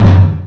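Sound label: Collision Interior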